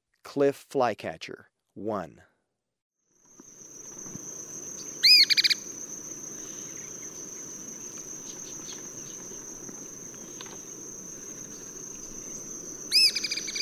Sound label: Cliff Flycatcher, Birds of Ecuador; S. America